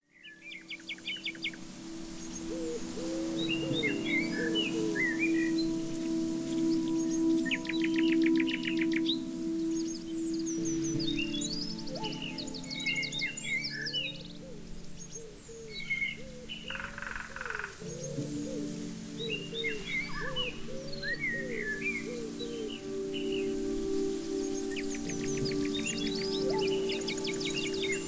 autumn breeze

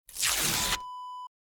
paper rip

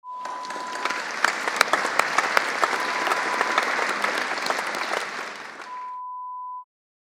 Applause Large Hall sound

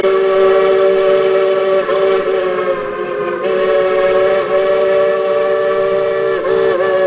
prairie whistle

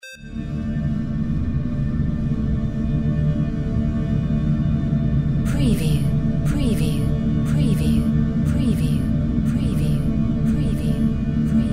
drone brass generator fx

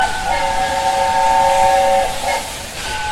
ring tornado

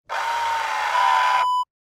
car side mirror adjust

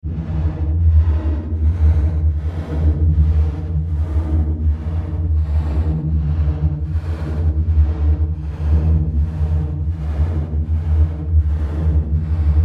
Sci-Fi SFX

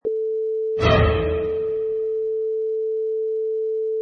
Orchestra Horror Sting

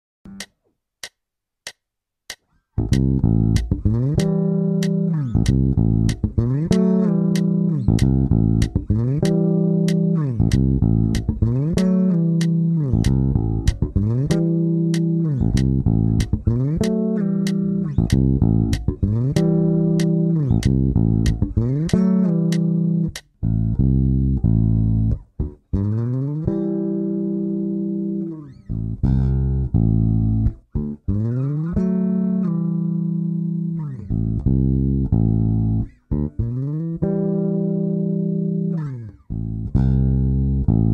Slides and doublestops in A

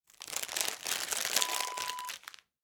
candy bag rustle

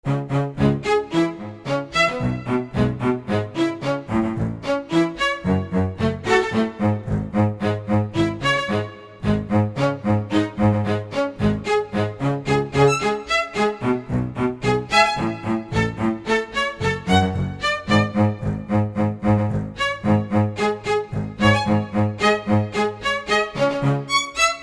Strings

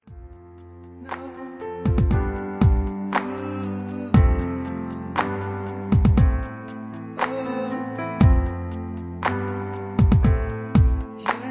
Instrumental

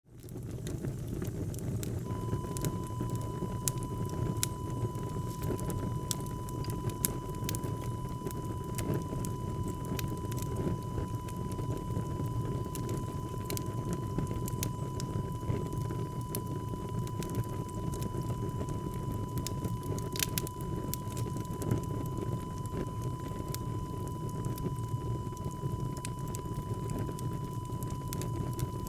fireplace

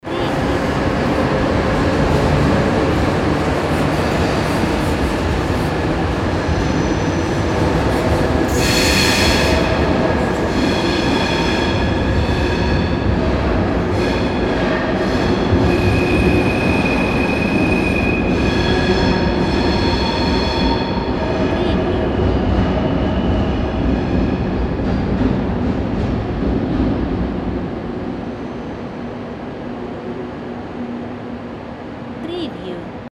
Train Goes Tunnel

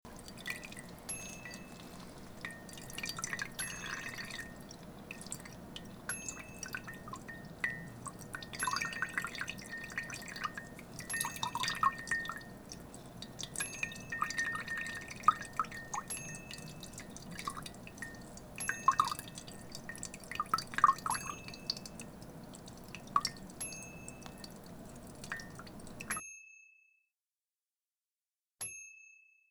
Water Dripping

